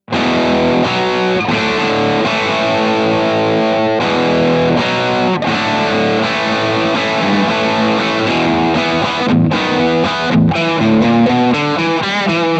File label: rock chords; jensentone